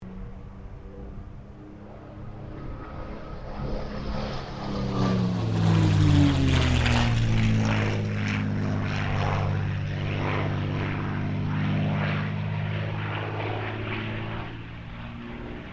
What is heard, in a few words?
AIRCRAFT CA-18 MUSTANG PASS